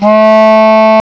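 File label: HARMONICA, REEDS; CMI Sound Library Rev 1.3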